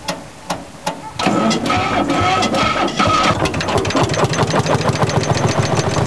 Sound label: Lanz